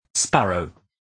sparrow

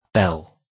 bell

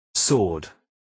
sword

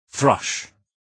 thrush

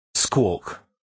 squawk

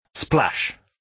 splash

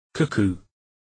cuckoo

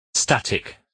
static

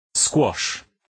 squash

